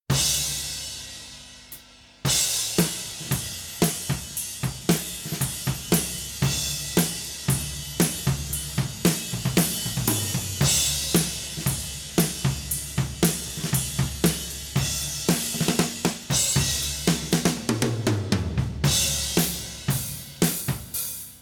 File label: drums